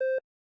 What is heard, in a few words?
pipipi